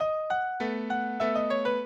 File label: minuet, mozart